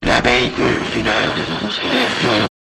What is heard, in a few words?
jingle